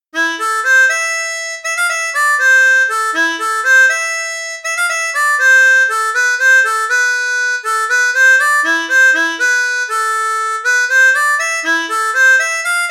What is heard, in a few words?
Emmas waltz, harmonica; Folkloriques